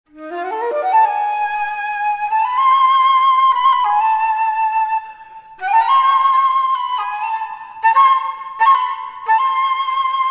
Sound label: Flute solo